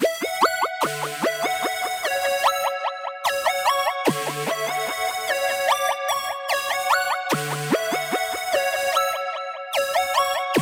148 BPM F# Min Lead, melody loop stem; Loop Stems; Cymatics; 2022 Melody Collection - Wavy; Man On Fire

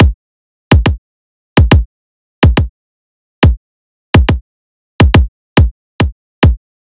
Riddim Drum Loop 140 Kick

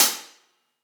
Hi Hat Acoustic; Drums; One Shots; Hi Hats; Ghosthack; Drum Mega Bundle; Drum Hero 5; Blacked Out

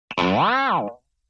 Boing Box Short Hit; Vintage Cartoon; Hits & Tones

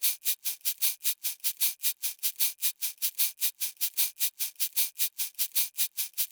Shaker Loop, 76 BPM; Drum Loops; Lofi; Cymatics; Lofi Starter Pack

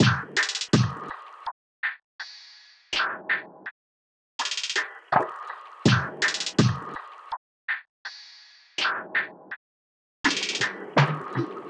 Loveless Perc Loop - 164 BPM; Cymatics; Fiji Hihat Loops & Percussion

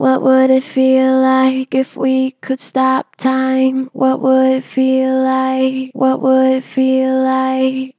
Pitch Flat Gentle, Vocals; What would it feel like; Harmor Vocals

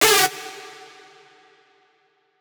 synth screech oneshot D; Synth Hits; GhostHack

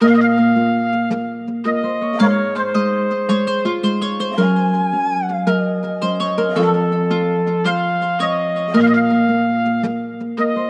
Nostalgic Melody Loop - 110 BPM A# Min; Cymatics; 2020 Melody Collection

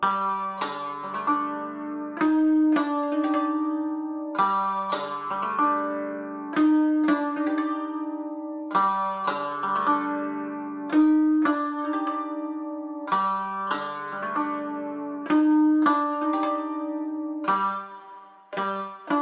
110 BPM G Min Koto; Cymatics; Cyprus

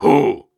Grunts and Chants, Viking Vocal

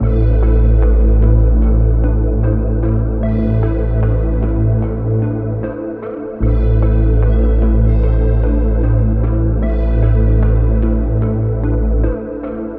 Full Am 150, Trap; HipHop; Melody Kits; Ghost

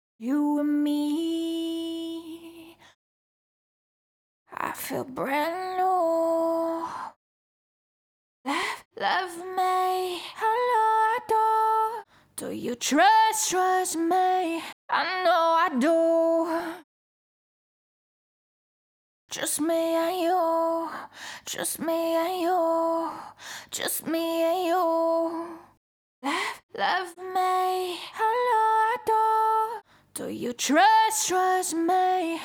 CLEAN (108 BPM Eminor), Acapellas, Pop Vocals; JUST ME AND YOU

